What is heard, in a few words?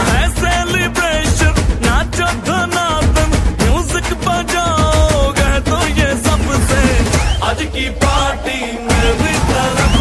Bollywood Song Ringtone